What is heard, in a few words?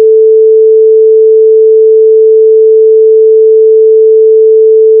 cosine 440Hz